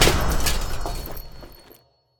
Sound Effect - Get Cosmetic

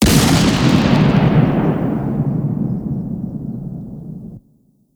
Sniper Shot (50 Cal)

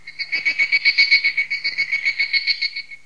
Cicada

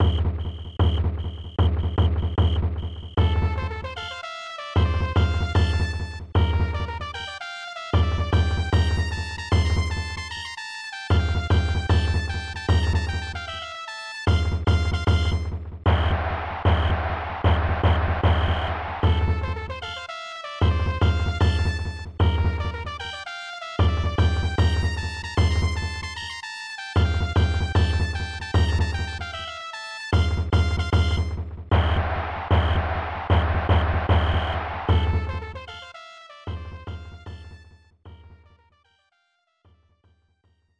Hitting March; 2nd Player, CPU; Jikkyou Powerful Pro Yakyuu 94; SNES

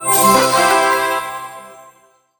notice upgrade

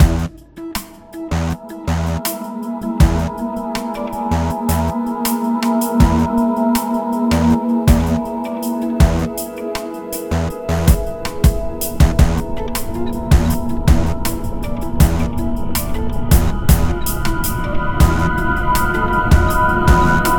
Tense

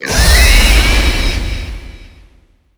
Pig Fly; Nicktoons Nitro; arcade

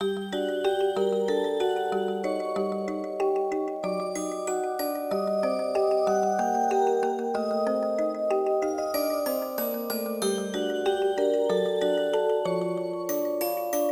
BGM Field Forest Loop; Basic; glitch busters stuck on you

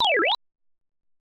Coin; Terra Force; arcade

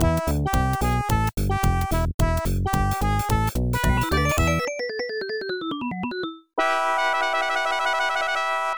Level Complete; donald duck in maui mallard; genesis

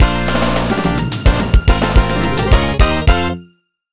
minigame stage clear

